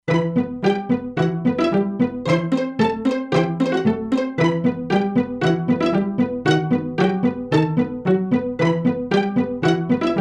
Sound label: Pizzicato, E-mu Proteus-2; Factory Presets